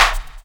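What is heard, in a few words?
clap hip hop; DRMKIT; E-Lab; X Static Goldmine 2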